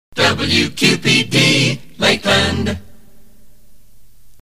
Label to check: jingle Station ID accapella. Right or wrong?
Right